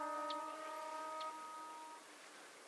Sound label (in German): weissbart-grasmuecke